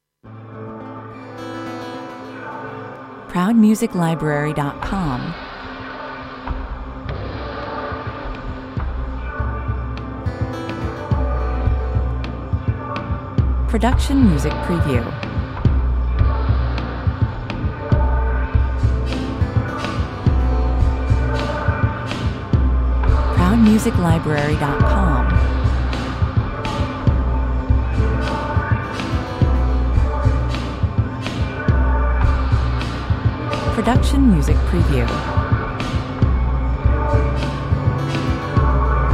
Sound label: guitar koma 105 bpm